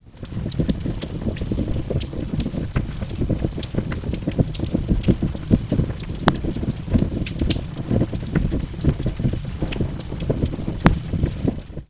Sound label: fire synth